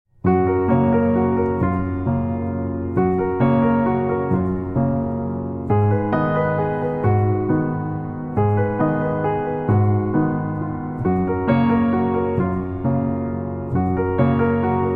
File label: Solo Piano Version